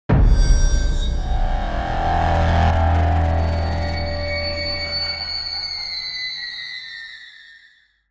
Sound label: Suspense logo intro effect ident; halloween